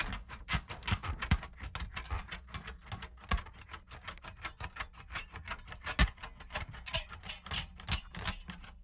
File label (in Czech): naradi do pozadi; Mafie 1